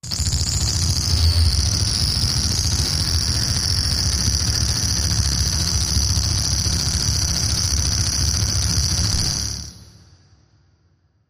Almost Electric, Machine, Electric Arc, Fast, Repeat Pulse, Sci-Fi